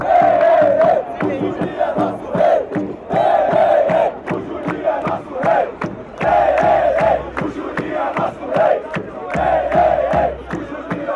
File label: hey hey fan chants